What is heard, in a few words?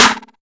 clean acoustic snare a key